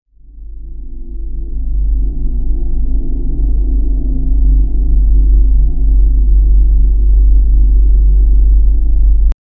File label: zen like ambient hum